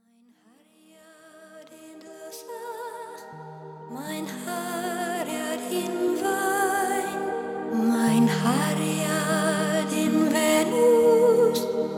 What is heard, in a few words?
Sweet Lullaby, Celtic